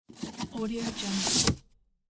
cardboard box open

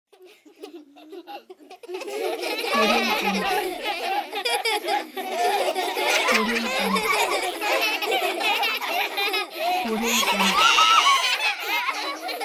children laughing; applehillstudios

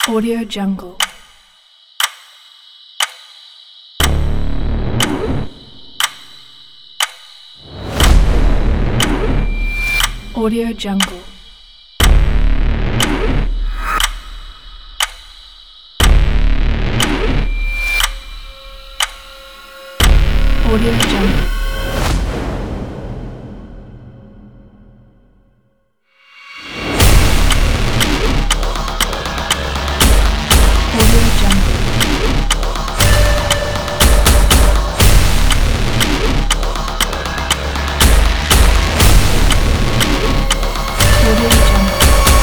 countdown trailer

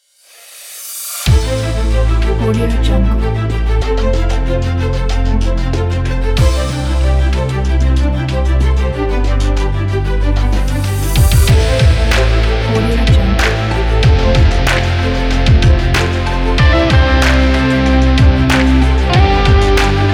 inspiring rock music

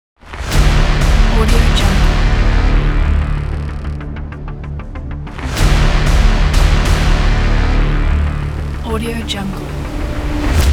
impact cinematic intro

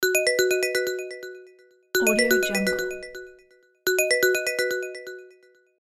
ringtone

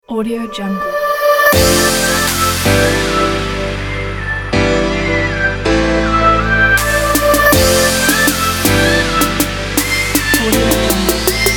future bass; ydjinsound

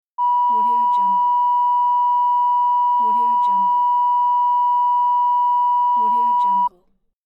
tv signal tone

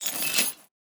mobile game action use pulley

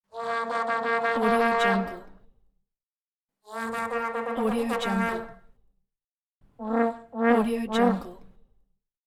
vuvuzela

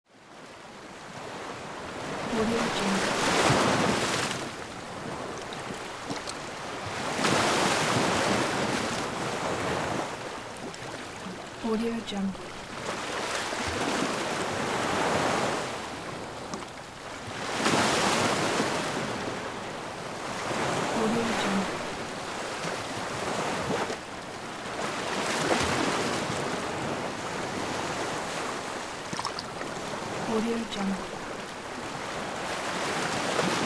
night beach

